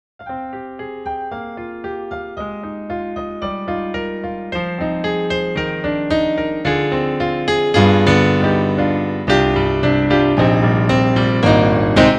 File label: HyperCanvas; EDIROL